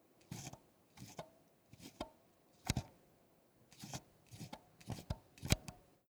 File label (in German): Volumeregler, Game Boy Advance SP; Mod AGS-001